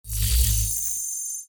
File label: data display, button